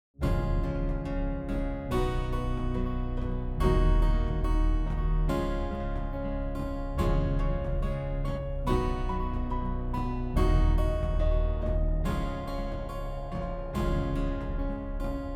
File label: Cinematic Uplifting Atmospheric; amperAI